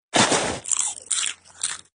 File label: Emote Ice Wizard Popcorn